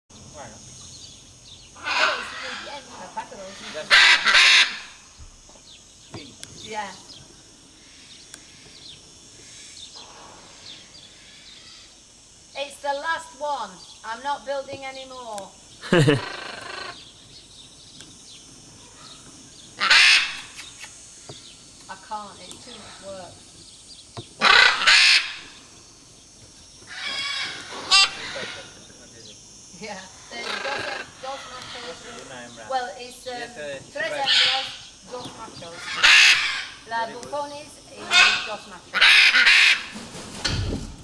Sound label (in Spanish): guacamayo ara ambigua; veterinario